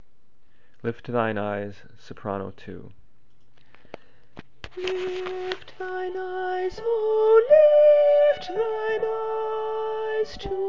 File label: sop 2; Lift Thine Eyes; rehearsal